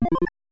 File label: Objective Complete